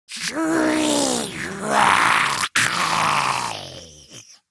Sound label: Attack Grineer Ghoul